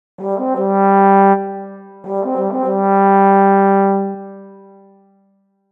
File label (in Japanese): 法螺貝